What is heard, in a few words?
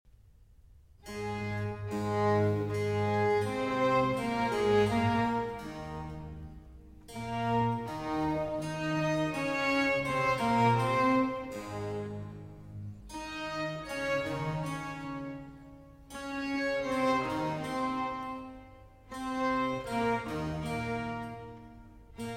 Violin Concerto in A Major; 12 Violin Concertos, Op. 9 - La Cetra - Concerto No. 2; RV345